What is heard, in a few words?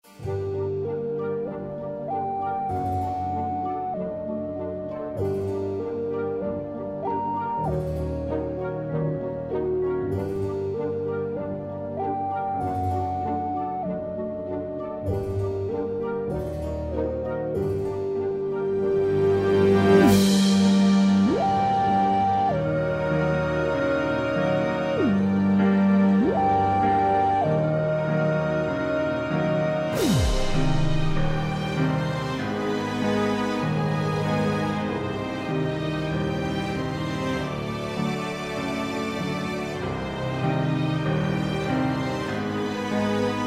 martenot